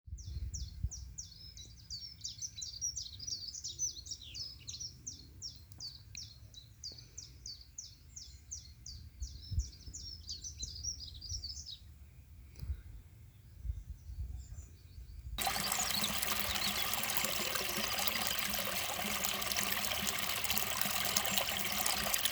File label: Walk along the train track Enschede